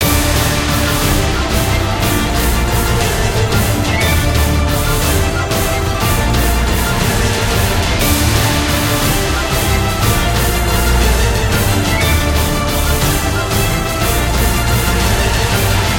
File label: big win loop; warlords